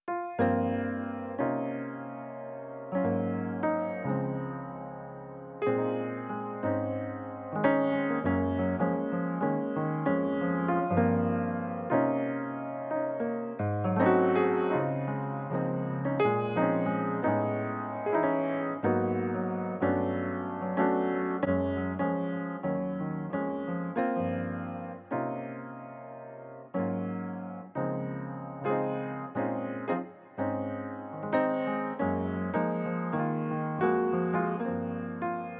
piano recording

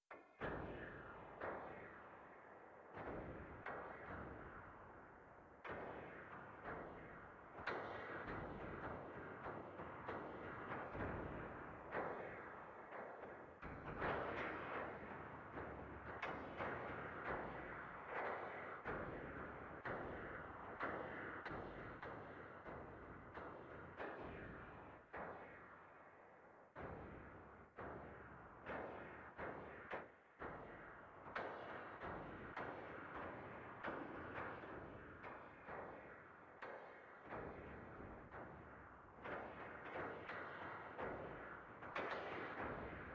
residual piano recording

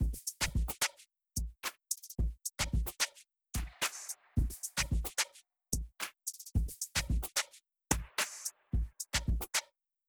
Drums Noise; Cymatics

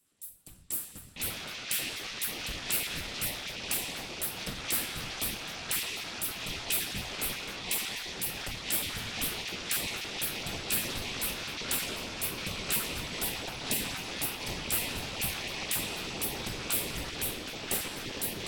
ROCK RIFF Residual Noise; AC3 96kbps

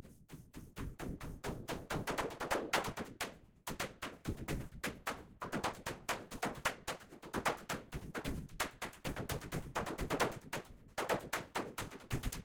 CONGAS Residual Noise; OGG Lowest

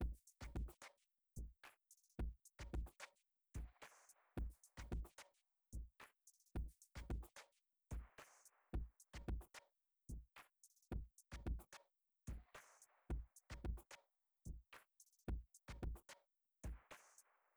Drums Noise; Cymatics

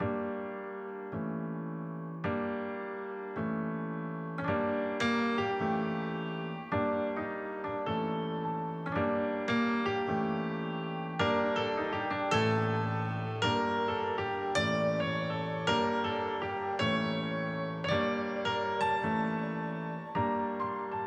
piano loop; Piano Tune MP3 320kbps

